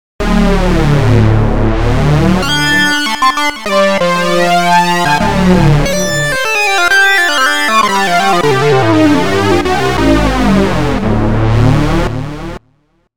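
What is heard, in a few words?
unison super sync; opx pro3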